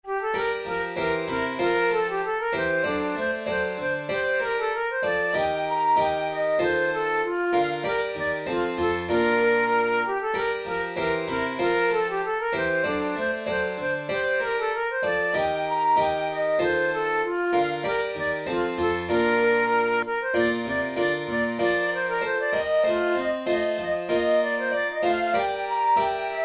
tempo giusto

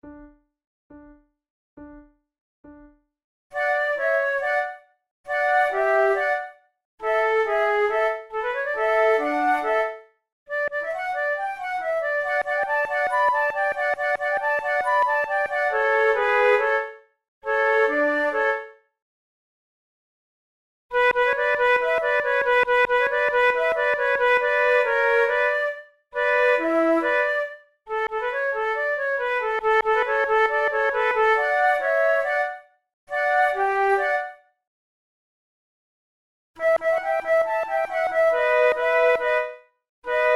flute trio allegro; quantz

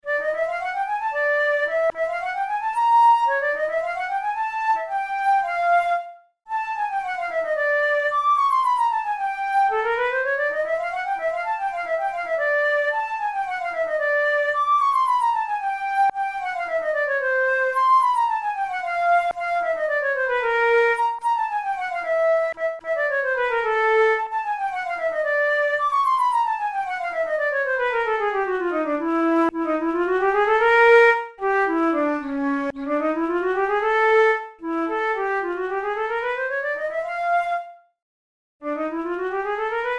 studies for the boehm flute; drouet 72

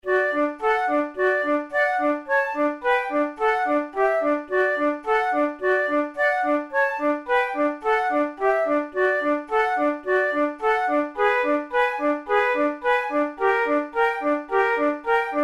methode de flute; tulou

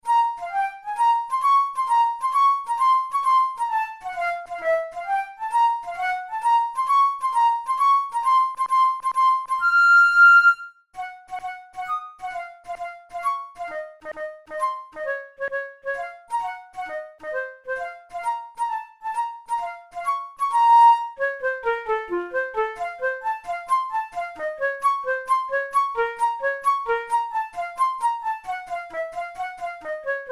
methode pour la flute study; drouet

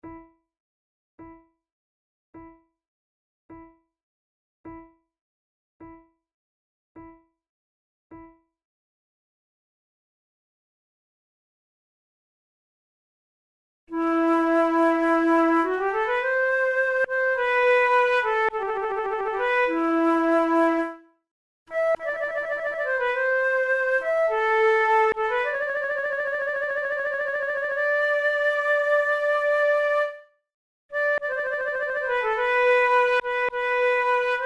duet grave, flute; quantz

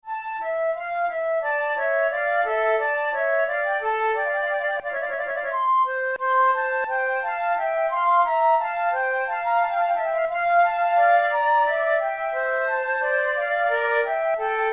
gavotte, flute

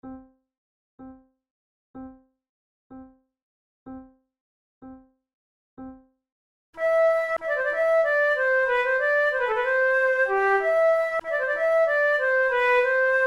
musette en rondeau; Naudot; 6 babioles

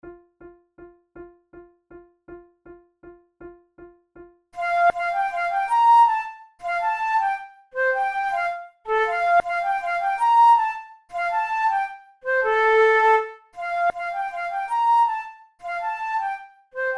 duet

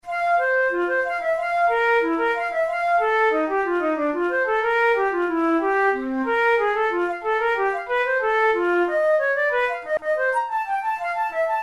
studies for flute solo; karg-elert

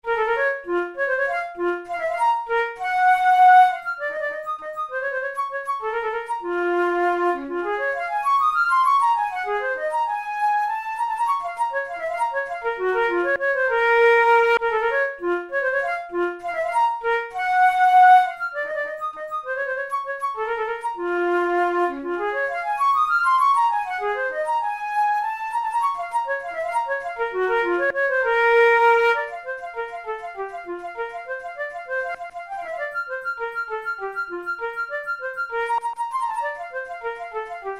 melodic exercises, flute tunes; kummer op110 no16

